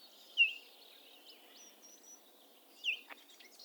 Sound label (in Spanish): Elanio Coliblanco